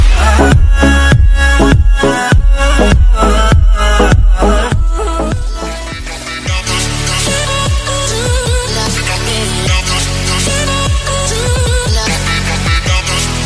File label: sad remix song ringtone